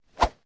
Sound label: Golf Club Swing